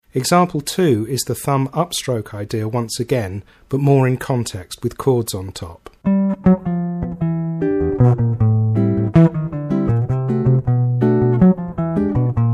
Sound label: Upstrokes With Chords On Top, Guitar